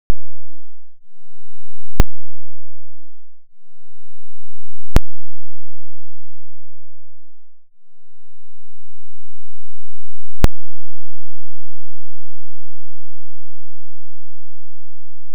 .5 Hz To .001 Hz Saw Down

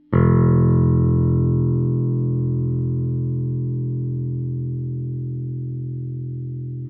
Bass Basic SingleNote short

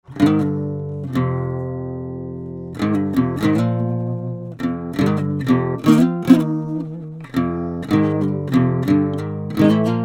Improv 9 inches; Presonus Jensen Xfrmrs

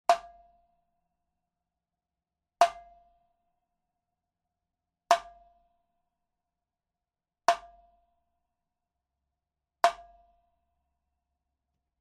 High Roto Tom single

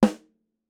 CM Snare Single short

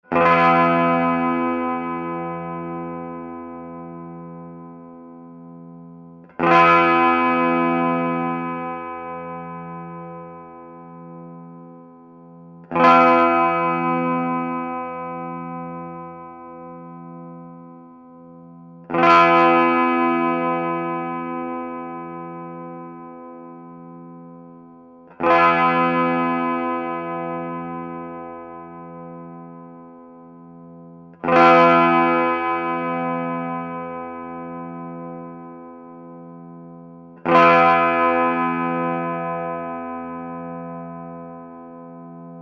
Electric Tube Chords

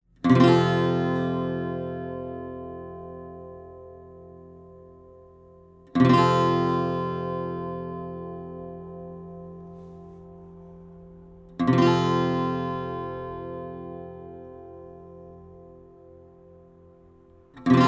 Papoose Chords; Presonus Jensen Xfrmrs

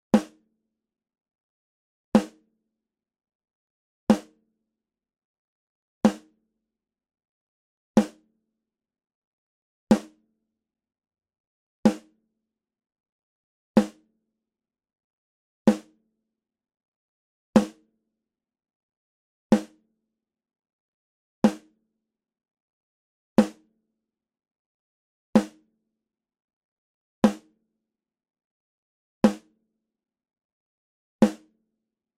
Snare Single

